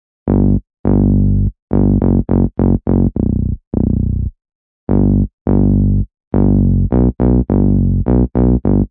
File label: 104 superheart-bassline, synth and electronic loops